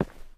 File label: ROCK, steps; Terrarum